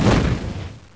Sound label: Flame Thrower; BaboViolent 2